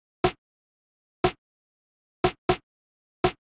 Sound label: Snare; VariBreaks; hydrogen